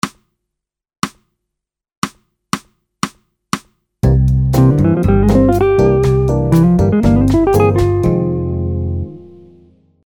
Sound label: Licks; Shape Idea Example